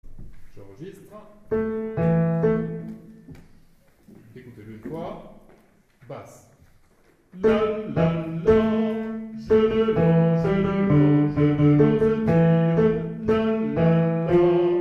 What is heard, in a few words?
La la la Basses